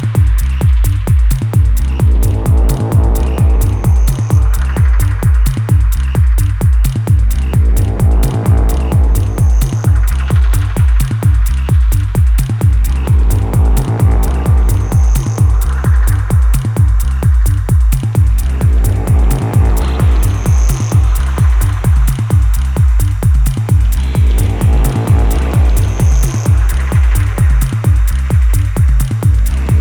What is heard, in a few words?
Drums